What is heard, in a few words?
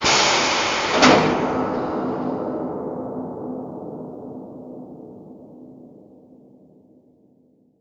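industrial ambience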